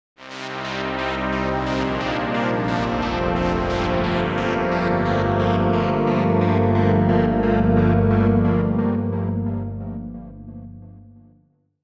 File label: matrix 12 pulse mod lfo; opxpro3